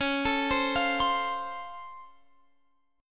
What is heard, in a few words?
C sharp Augmented Major 7th, C sharp, down